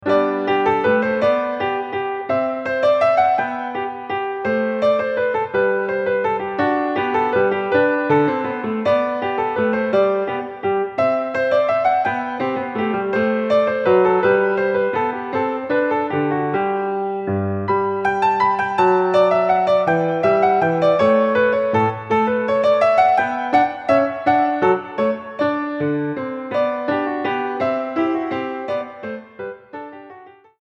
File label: minuet in g major